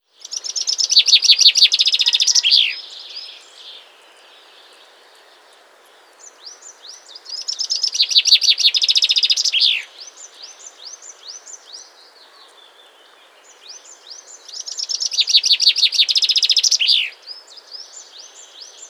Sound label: Chaffinch, birdsong